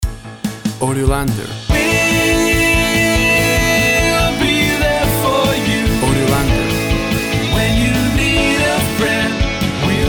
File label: Short Stinger Mix